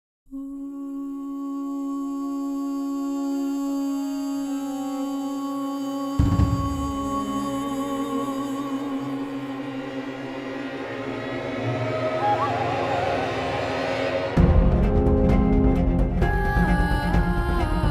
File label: Game Trailer Role Playing Game